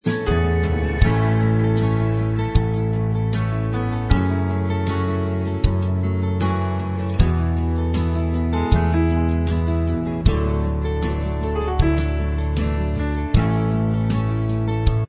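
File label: instrumental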